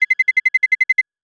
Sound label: arm bomb; Counter Strike Model Pack; CS:GO